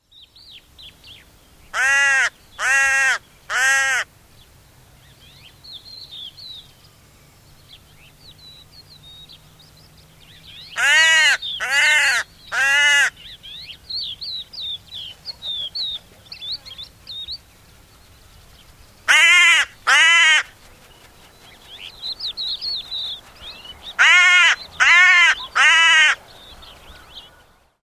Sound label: hooded crow; Tooma, Endla Looduskaitseala, Estonia; Call from damp scrub; 58º 52' 35.3'' N 26º 16' 22.7'' E